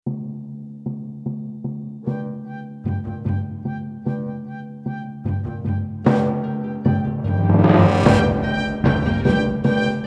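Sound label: Heartbeat, game music; Pool of Radiance Ruins of Myth Drannor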